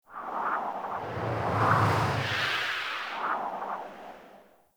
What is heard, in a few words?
Medium Wind Gust; Wind Gusts